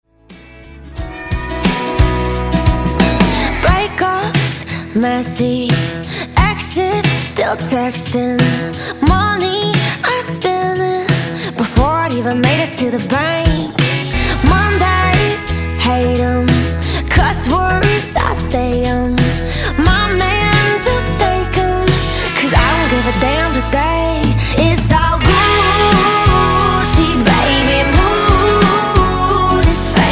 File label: Country Dj Intro Outro 89 Bpm